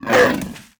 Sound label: NEO WAR BEAST DAMAGE